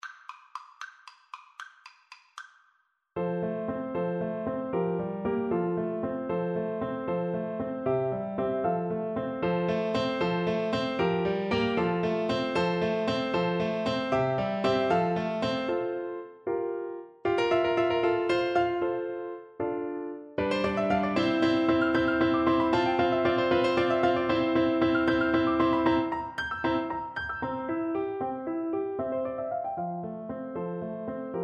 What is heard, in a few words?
sonatina, Flute; clementi 1 op 36